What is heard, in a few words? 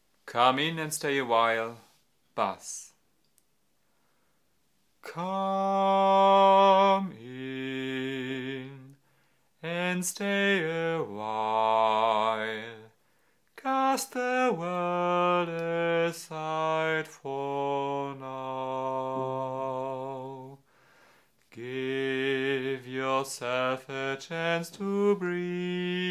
Bass